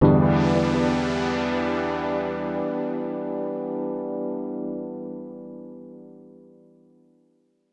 D&B Pad; neuro

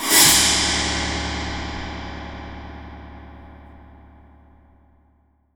RIDGED GO, GONGS; Voice Crystal - Steve Reid Definitive Percussion

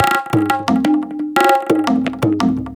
Tabla Effekts, percussion loops; Drum n Bass; KJ Sawka; Drum n Bass & Beyond; Drum Tool Box

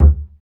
acoustic bass fx; Spectrasonics; Bass Legends

